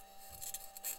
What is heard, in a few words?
mic, mars2020 edlcam